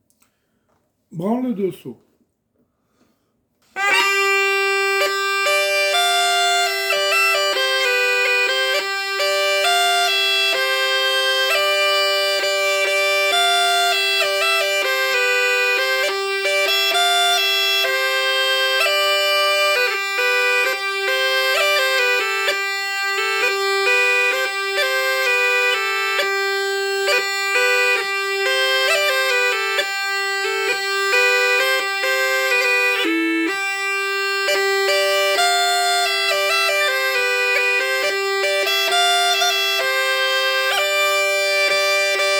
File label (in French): Branle d'Ossau